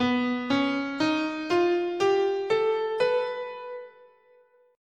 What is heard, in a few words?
B Whole Tone, scale